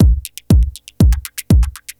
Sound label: Tech Beat 120, techno 120bpm; musicradar; Beats